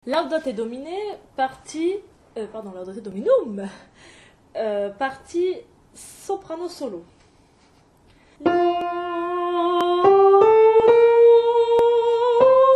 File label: Kyrie Soprano Solo; Vivaldi 3mvt Nimes